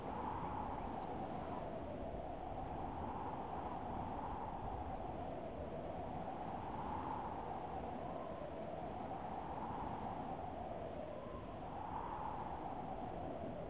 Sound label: Env desert